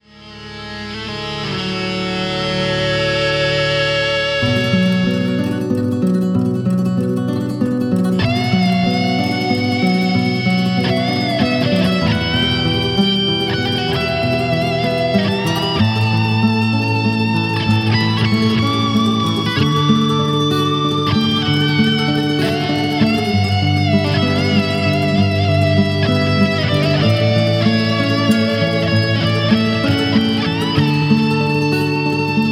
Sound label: Spanish Rolls